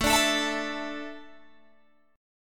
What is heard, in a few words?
A Suspended 4th, Guitar Open G